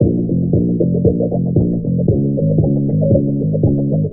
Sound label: bass, Loops Phonky